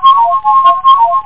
ALARM; drwho

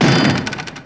Door dam; Dark; thiefdg

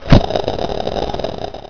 Launch; Centaurian

